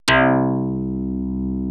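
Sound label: C3, synth, Progress DX Moog, CASIO FZ-1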